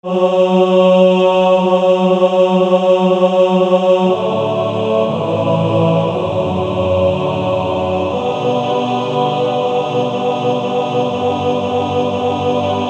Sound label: Male Choir TTBB; God Be with You